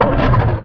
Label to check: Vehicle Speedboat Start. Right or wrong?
Right